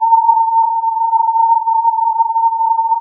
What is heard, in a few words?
filtered input